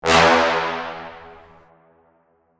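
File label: Sting Small Brass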